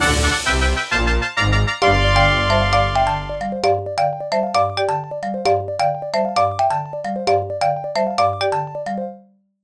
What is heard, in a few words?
Power-Up Discovered; Super Mario Galaxy 2